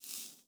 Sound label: Soft Dry Rustle